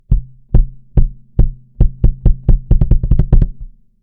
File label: kick; BASSROOM